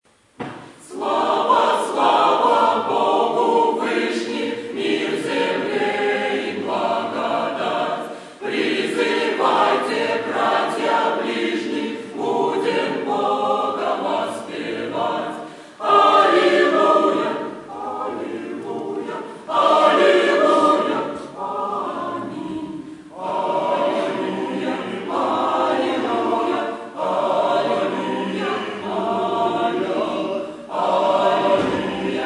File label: choir